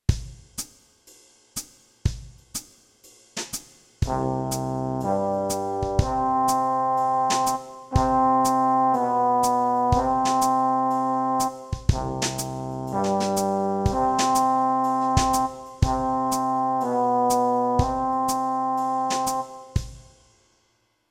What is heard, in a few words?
New notes Eb, brass; backing tracks